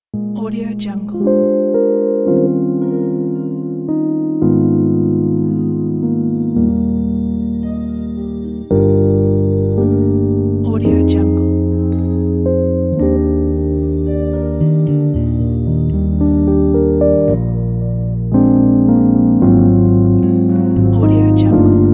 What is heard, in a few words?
romantic jazzy electric piano retro